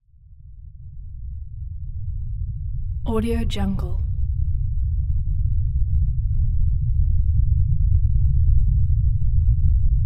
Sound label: earthquake rumble; غرش زلزله